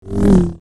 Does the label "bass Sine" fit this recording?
No